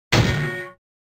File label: ground hit